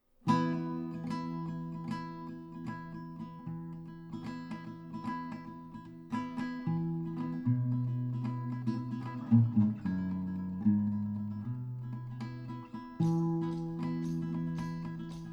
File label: Lydian Summer